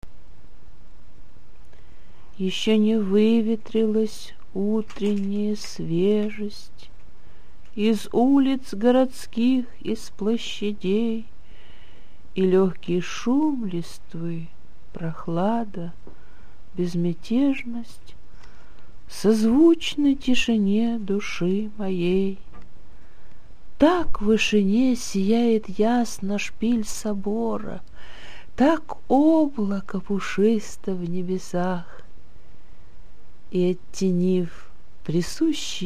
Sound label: inst